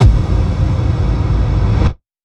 reverb decay

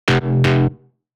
Brazilian Bass upper

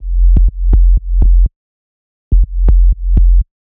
Brazilian Bass sub

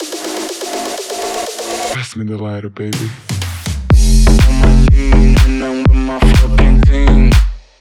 Brazilian Bass cymbal

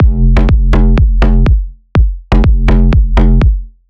Brazilian Bass bass kick

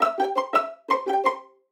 pizzicato

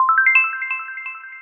synth bell

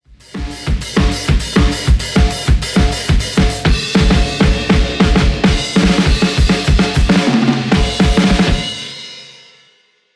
Drum 2 Mic

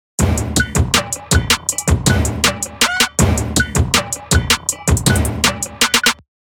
Trap snare

